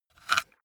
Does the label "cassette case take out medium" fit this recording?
Yes